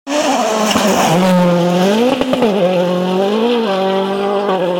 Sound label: rally; wrc